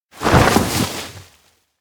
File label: slip; anemoi